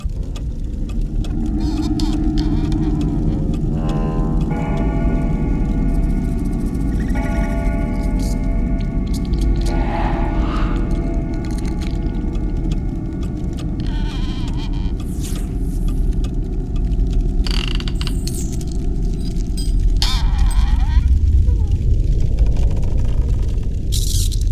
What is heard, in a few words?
Ambient Mansion; Razoff's Mansion